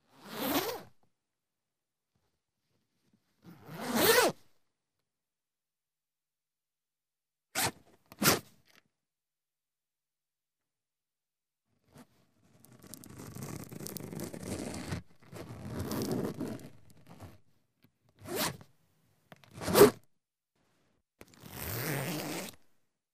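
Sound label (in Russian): застёгивают молнию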